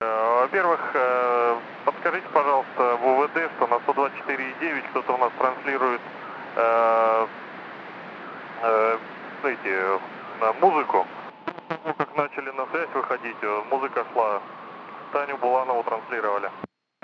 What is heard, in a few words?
music